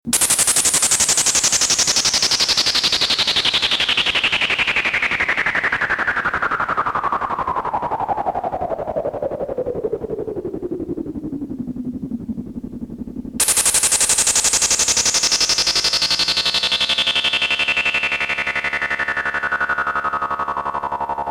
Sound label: noise pat square mod